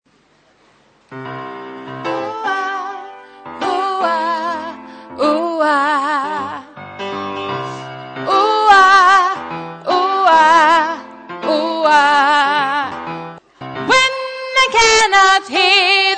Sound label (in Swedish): sopran